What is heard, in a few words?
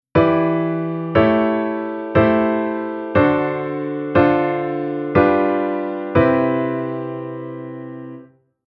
Chord Ex Dom